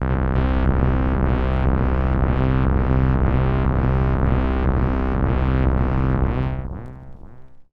Jupiter4 Saw Delay Vinyl Synths C1; vinyl leads

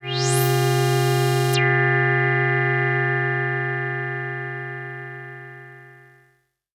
SYNTH Soft Multi Brass Filtered

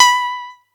atari 520st B5

